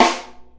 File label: Snare, drums; Ableton Live 12 Lite Core Library